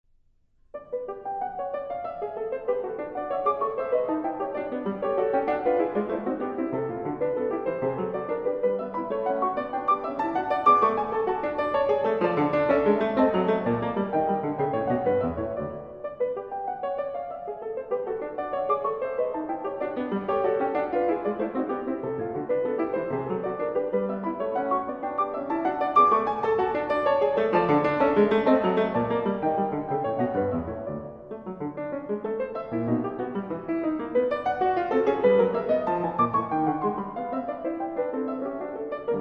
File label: Gigue In G Major; KV 574